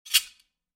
sword click; soundscrate

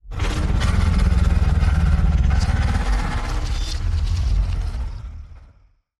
metal beast; soundscrate

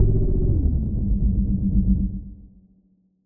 synth fall; soundscrate

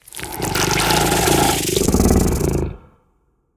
monster breath and rattle; soundscrate

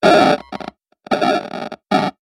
computer glitch

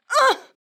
woman injured grunt

